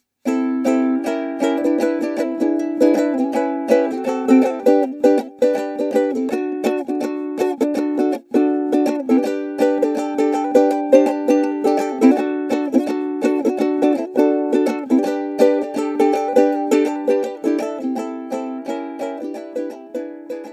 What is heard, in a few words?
Ukulele; Ukulele-Audio-Example